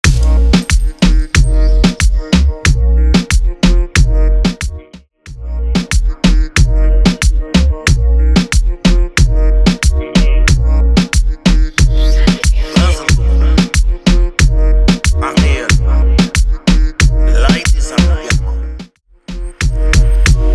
Reggaeton Intro Outro Dirty 92 BPM

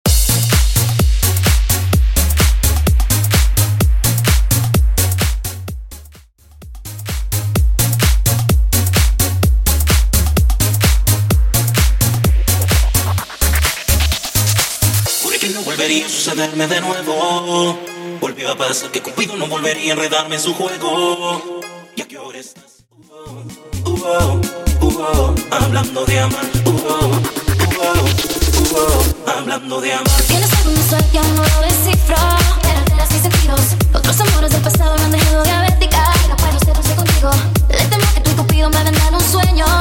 Intro Outro Clean 128 BPM House

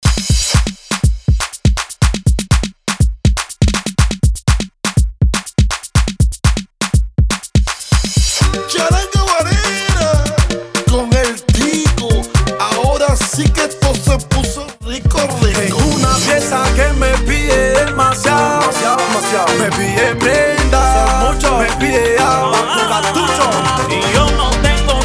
Intro Clean Outro 122 BPM

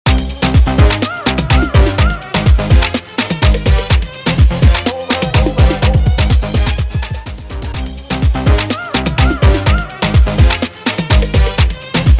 Intro In Chorus Clean Outro 125 Bpm

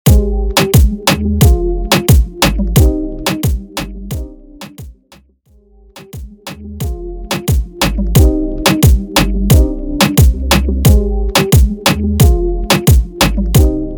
Chorus In Intro Outro Dirty 89 BPM Reggaeton

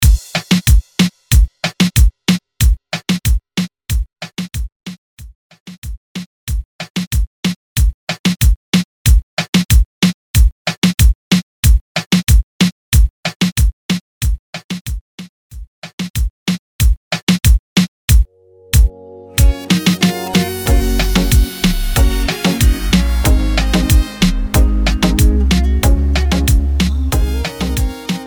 Intro Outro Extender Clean 93 Bpm

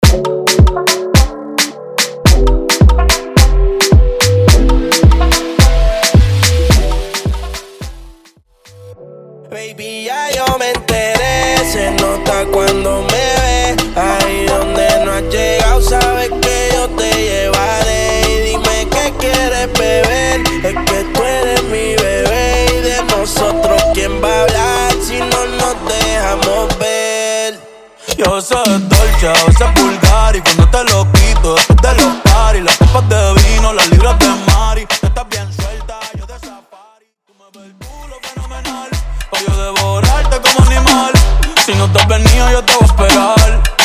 Intro Outro Dirty 108 BPM Moombahton

